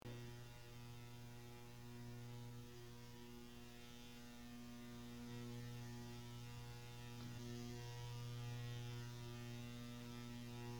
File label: forcefield; background; TOS